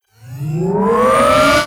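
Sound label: Riser Reverse Power Ups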